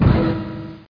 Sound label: imp